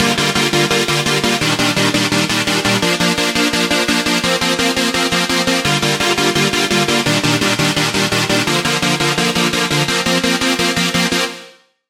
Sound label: chord; Purify; Routed Sound; Serum 2 Expansion - Flame Summoner Vol.1